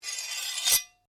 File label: Blade Scrape Flick, Sword; Foley sounds for melee weapons; Combat